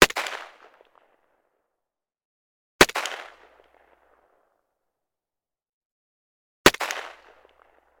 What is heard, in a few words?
gun carl gustav m45 swedish k; still north media